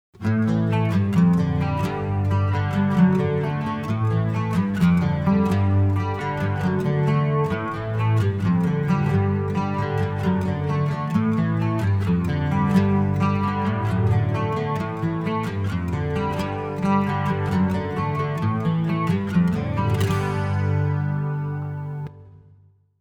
Acoustic; Mr. Tabs

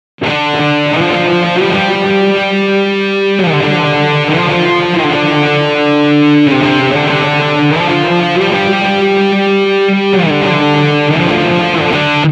Guitar Left intro

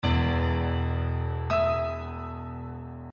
Intro Piano

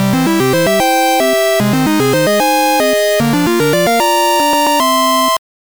Flagpole, guitar

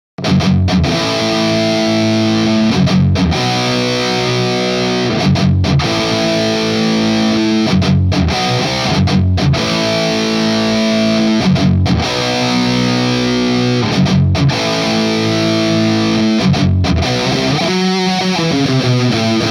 Intro Guitar Left

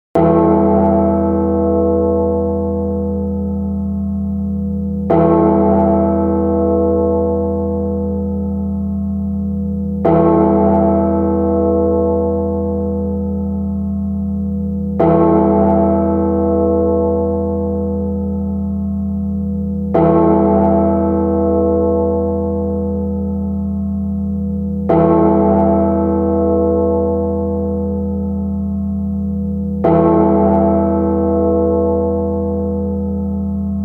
Bell Boom

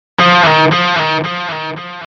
Intro Delay Guitar

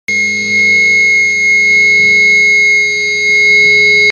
Feedback Fill, Guitar

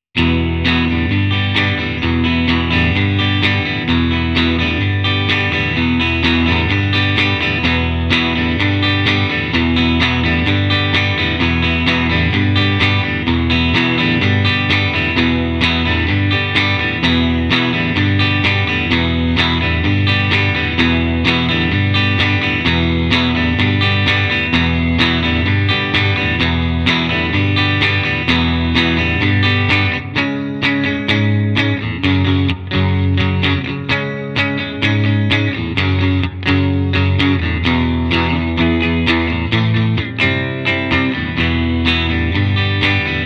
Clean Guitar

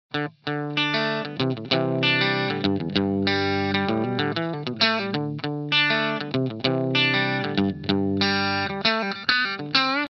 Intro Guitar

